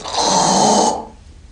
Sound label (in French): racler gorge